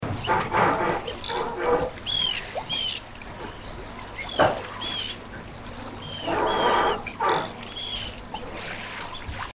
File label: atern FL AD FC JUV CKIT; mv hydrogr